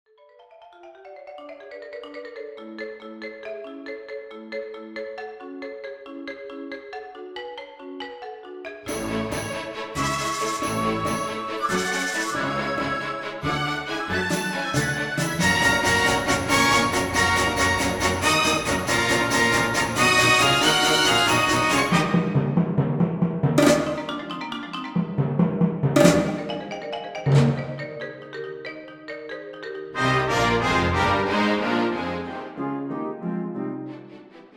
marimba concerto, Concerto for Marimba and Small Orchestra